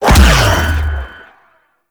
Whirlwind Dash Empowered Impact B; Warframe; Warframes; Caliban

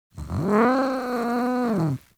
Feral Vocal Idle F; Warframe; Catbrow

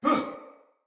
Surprised Soldier Male; Battle; Barks; Dialog; Sinecure Soldier Male B

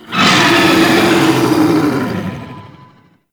Attack; Warframe; Tau; Sentient Dactolyst

